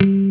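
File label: PICK AC BASS; Pro-Rec - Synthsonic; TRINITY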